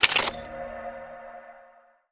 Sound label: item sticker apply confirm